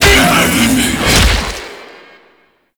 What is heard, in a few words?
Zoo Ape Jump; Prelate; Warframe; NineteenNinetyNine; Stage10TheZoo